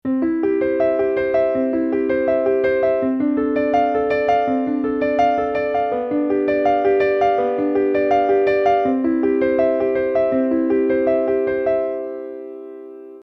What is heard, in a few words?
Prelude C Just, temperament